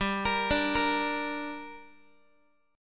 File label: G Minor G down Guitar, chord